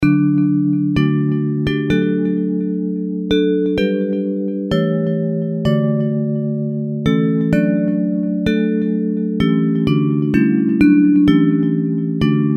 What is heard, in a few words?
Bells